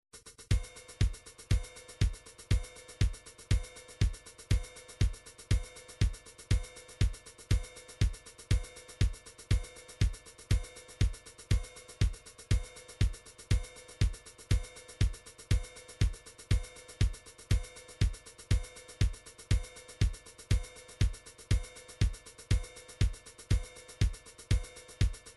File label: drums, midi drums